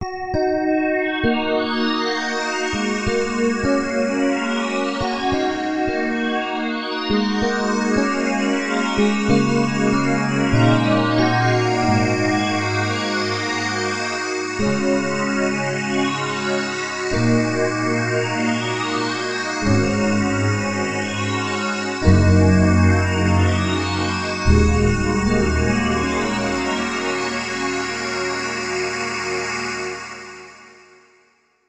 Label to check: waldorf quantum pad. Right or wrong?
Right